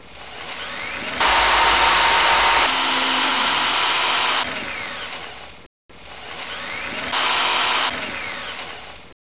drill mix

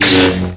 saber hit

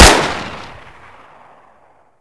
vector, weapons

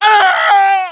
damage

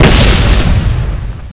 tank shot

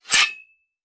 hit wall, weapons

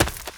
Player Run Dirt

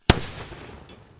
buy bomb; ttt

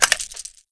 assault draw

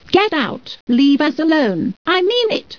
knife lady

